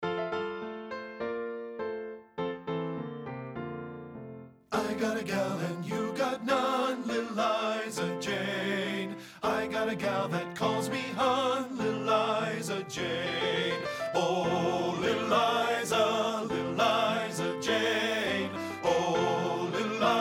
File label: bass; LIL LIZA JANE